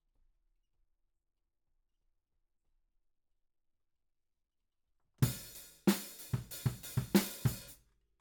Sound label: Ride